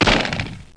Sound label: shotgun; duke nukem total meltdown